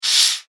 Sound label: Torture Chamber Sharpen The Blade; Scary Soundscape Sound Effects; horror sound effect